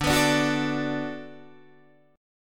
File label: D 6th D down Guitar Open D, chord